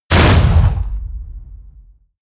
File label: blast, hits explosionen metall